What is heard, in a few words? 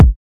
00s reverb kickdrum a sharp key, Royality free kick drum sample tuned to the A# note; kick drum samples